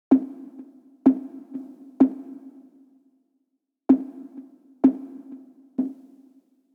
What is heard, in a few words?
SYNTH low